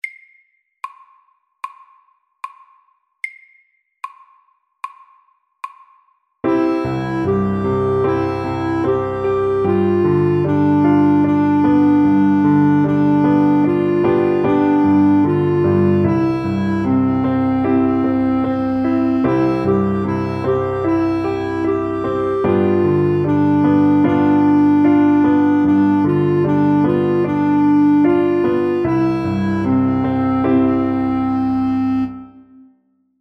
Walesisk melodi SLOW

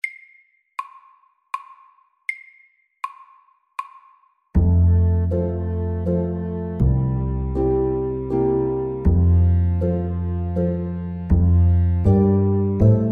Sommervals SAKTE

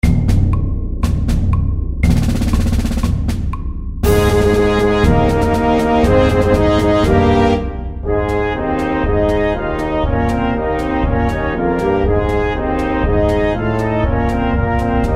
Fanfare and march FORT

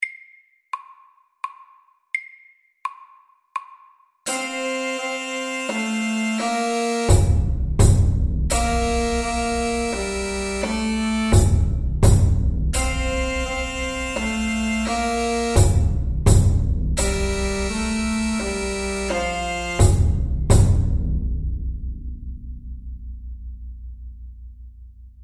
Stomp MIDDELS

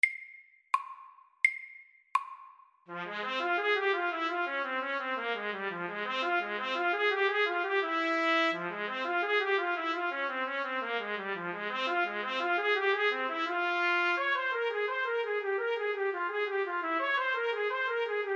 G minor etude MIDDELS

